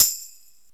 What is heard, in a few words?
TAMB HIT, TAMBOURINE